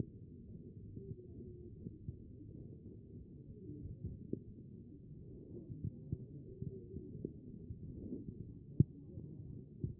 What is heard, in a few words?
chest; B MODE